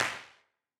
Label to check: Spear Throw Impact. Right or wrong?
Wrong